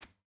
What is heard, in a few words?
footstep barefoot cloth jump start; Rust